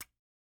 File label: Household Match